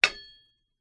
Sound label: metal mining pick axe fantasy material action movement texture; ancient game